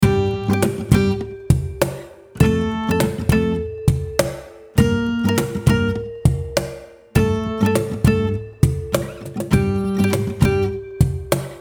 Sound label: guitar